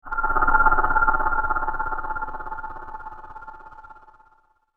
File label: Ambient Chill Factor, scary soundscape